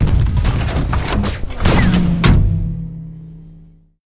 police car spawn; Original TK Sounds

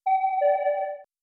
Item Chooser Close; ESAN VO Sound Schemes; ESAN Business SSound Pack

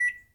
microwave beep; Golden Crayon Sounds

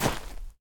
dirt land; Golden Crayon Sounds

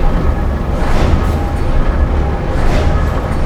machinery; Golden Crayon Sounds

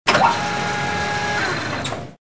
carrier missile deposit; Original TK Sounds

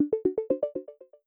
Rattle, Text Tones; iOS 17 Tones

